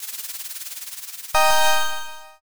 answer roll

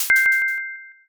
block clear

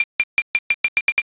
hyoshigi roll

